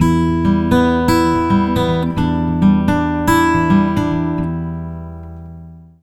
110 MIN PICK E, Acoustic Guitar Loops; USB Soundscan vol.04 - Electric & Acoustic Guitar Loops; AKAI

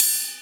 Rides, Cymbals

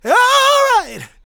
gospel male, vocal; ILIO; Vocal Planet

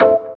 DR. LOOP; X-Static Goldmine 3; CHORDS

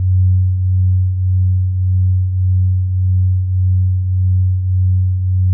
F#2, synth basses; Music Store Sound Service; Einstein

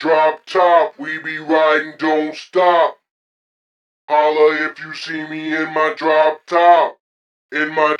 Screwed Vocal; Sonic Foundry (Sony Creative Software); Club Joints and Street Anthems; Drop Top

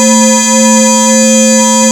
BELL C4

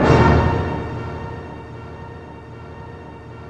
CLASSIC, CLASS.HIT; East West; Global Sounds

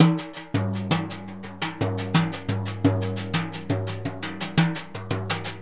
Synclavier Percussion, World & Orchestral; ILIO; Synclavier Percussion 2

